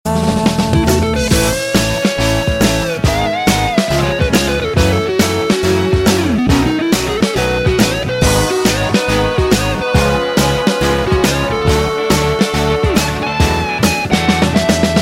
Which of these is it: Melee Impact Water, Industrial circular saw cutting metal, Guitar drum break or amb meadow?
Guitar drum break